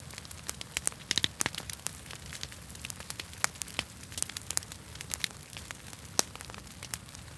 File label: bonfire loop